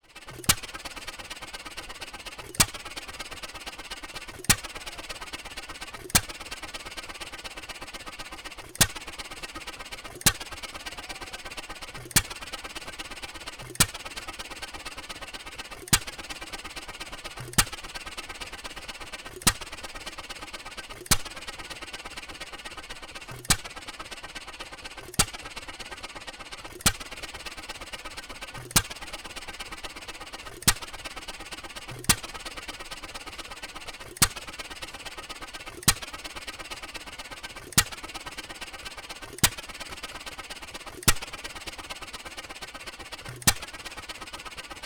Engines john deere type E fast air puff chuff air pipe compressed, Early Gasoline Engines; JC Sound